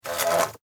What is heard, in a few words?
Wasp Movement, Wasp, Movement, Wings, Buzz, Close, Insect, Bug; Sound Effects:Animals:Insects; Wasps; Blastwave